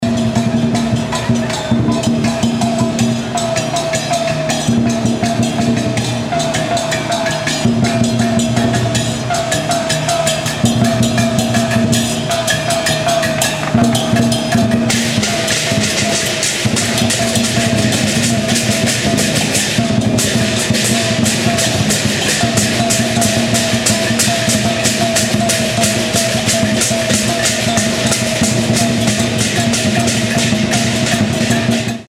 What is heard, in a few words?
street band in china